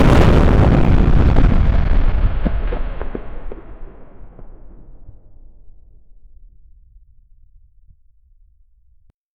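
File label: huge explosion after, bomb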